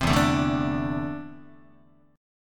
E 7th Suspended 4th Sharp 5th E down Guitar 4A 4E 5A 5D 5G sharp 6C sharp; E7sus4#5 strummed; JGuitar